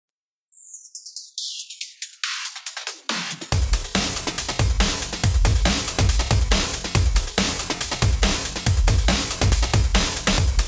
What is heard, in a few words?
behind the fight drums